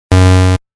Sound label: incorrect